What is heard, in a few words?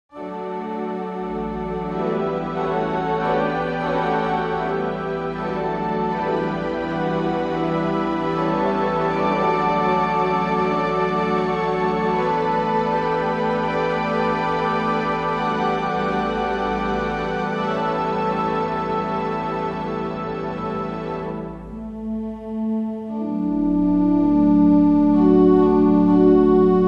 amazing grace, carillon